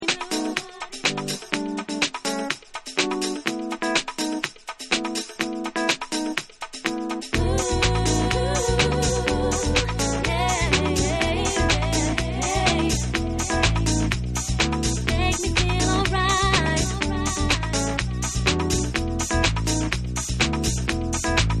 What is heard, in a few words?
HOUSE MUSIC